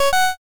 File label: pico hello